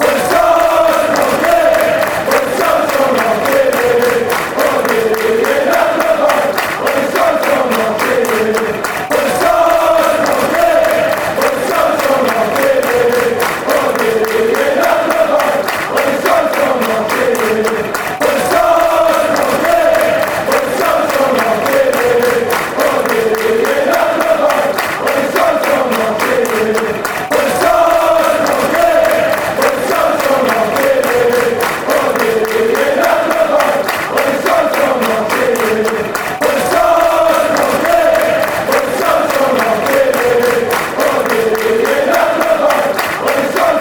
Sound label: when the stripes go marching fanchants